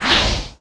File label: Death Coil Missile Launch; warcraft3